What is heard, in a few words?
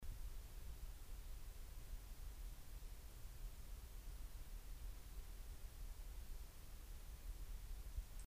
Samson Q1U Self Noise